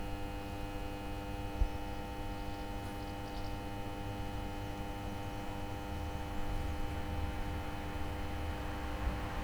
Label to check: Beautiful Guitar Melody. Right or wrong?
Wrong